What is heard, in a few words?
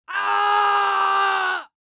Young boy screaming